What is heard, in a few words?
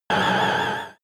extrinsic siren, energie